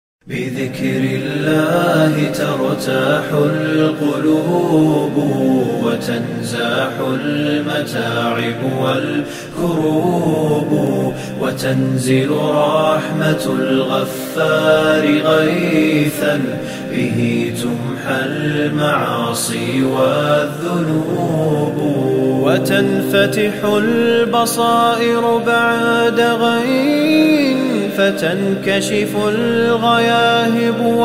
nasheed нашид очень мощный нашид beautiful nasheed very powerful